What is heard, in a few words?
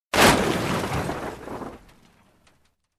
Wood Slam Sound